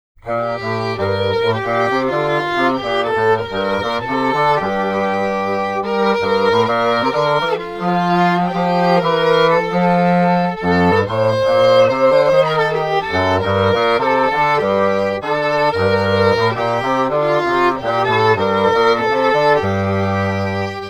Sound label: Bach Chorale SF Chroma resyn